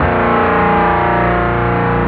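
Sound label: electric guitar P4th; jazz jackrabbit 2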